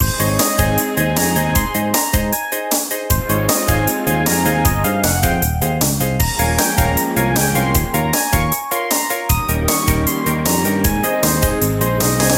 Platform Game Theme Loop

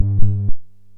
Rec bass

